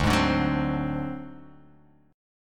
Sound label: D 7th Flat 5th D down, chord; D7b5 strummed; JGuitar